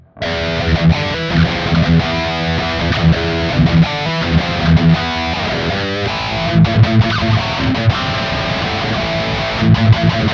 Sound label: Ibanez RG631ALF Bridge Pickup Highgain